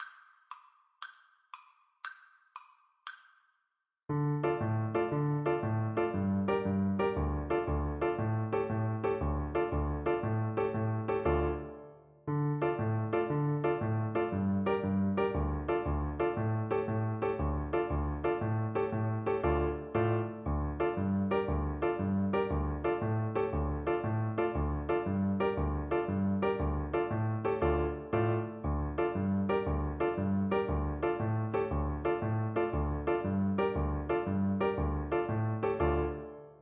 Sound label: Trumpet